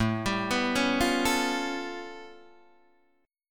A Augmented 9th A Guitar Open D

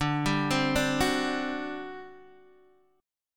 G Major 7th Suspended 4th, D

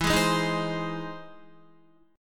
E Augmented Major 7th, Guitar; strummed; E+M7; JGuitar

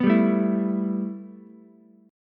A 7th Suspended 2nd A, Ukulele; strummed

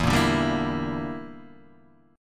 E Minor 7th Flat 5th E, Guitar DADGAD

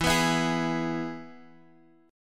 E Major, Guitar, DADGAD; chord shape

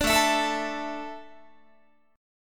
A Suspended 4th, Guitar; Asus4; strummed

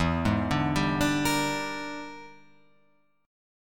E 7th Sharp 9th Flat 5th Guitar Open F, chord; E7#9b5 arpeggiated; JGuitar

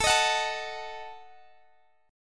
Eb Diminished A

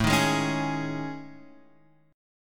A Major 7th Flat 5th

